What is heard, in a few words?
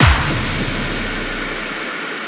fx clap